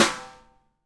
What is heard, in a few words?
SONOR, SONOR SNARE